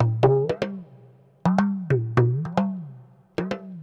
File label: PERCLOOP ETHNO 125; eJay